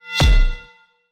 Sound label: Epic Metal Hit; soundscrate